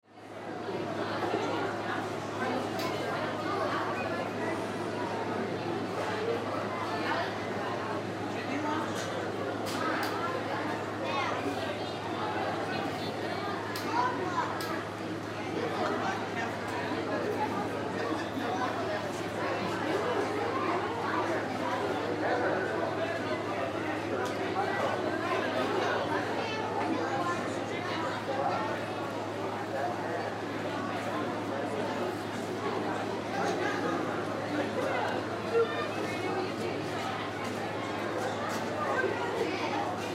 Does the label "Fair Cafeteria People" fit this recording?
Yes